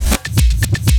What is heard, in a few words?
SHUFF BK 120 BP; Rarefaction; Poke in The Ear With A Sharp Stick